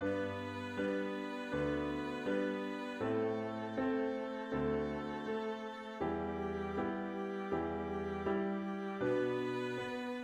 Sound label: jolly old st nick piano slow 80